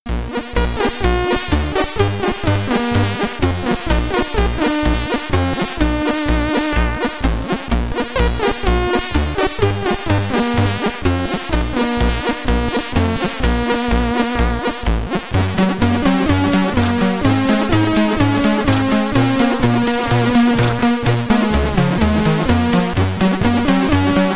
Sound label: Ending, SID Stereo; Tarzan Goes Ape; Commodore 64